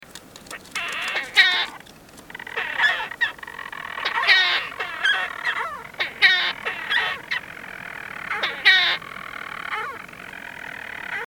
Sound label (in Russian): pryamohvostaya kachurka golos